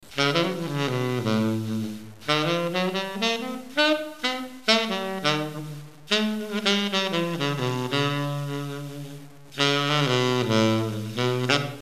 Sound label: bell notes, saxophones